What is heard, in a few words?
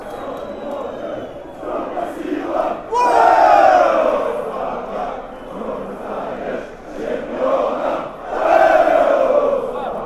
spartak chant